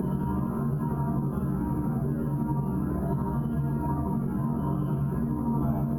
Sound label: Tonal Re Draw, sparse soundscape